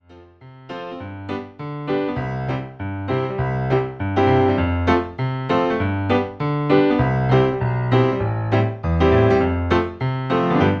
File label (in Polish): Kropelka wody wersja instrumentalna